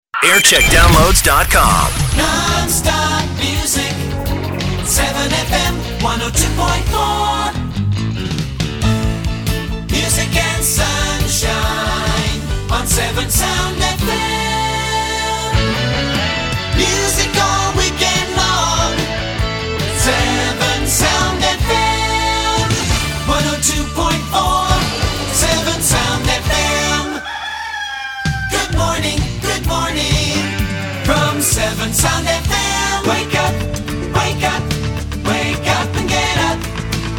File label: jingles; Severn Sound Gloucester; Jingle Montage (JAM) Oct 1990; 1990s